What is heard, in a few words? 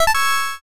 progress done